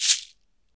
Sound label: SHEKE, Afro Shakers, Shekeres